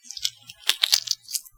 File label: Egg Crack